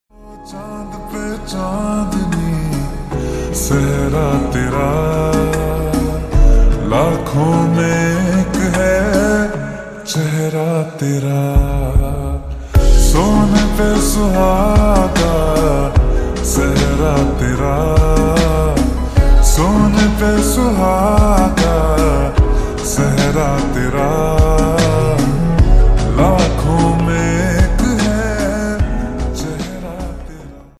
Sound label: Slowed Reverb Ringtone